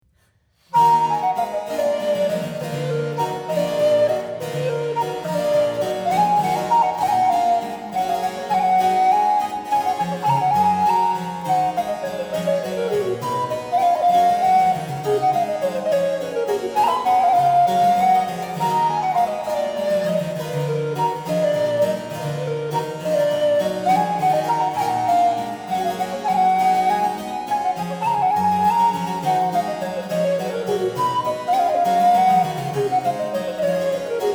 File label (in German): Menuett